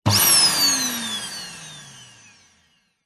household vacuum on off very short burst false start